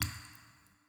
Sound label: Water Drop